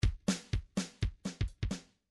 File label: midi grooves pop